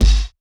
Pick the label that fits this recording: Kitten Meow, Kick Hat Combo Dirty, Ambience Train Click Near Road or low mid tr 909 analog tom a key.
Kick Hat Combo Dirty